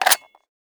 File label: ssg08 clip in, weapons; Counter Strike Model Pack; CS2